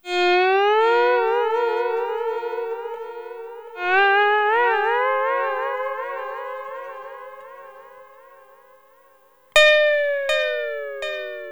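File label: Sunrise Guitar Fx C, Lounge; Zero-G Kocktail Kollection; Construction Kitz (Lounge); Sunrise Mixes 082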